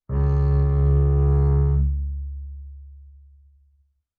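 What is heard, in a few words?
Bass arco fortissimo sul E Eb2, Double Bass; MIS Pitches - 2014; Strings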